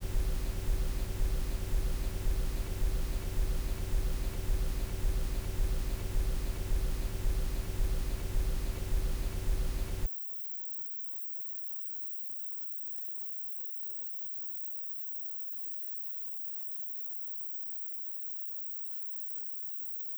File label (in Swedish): 10s mik + lokal, sedan 10s 16bit ditherbrus; båda höjda 40dB efter bouncing